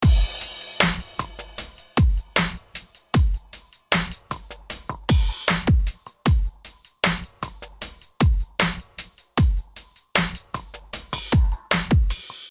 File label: Drumgroove 1176LN